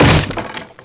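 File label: wood break; sw demo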